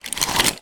household medicine tablets pack foil blister pack remove from box; zapsplat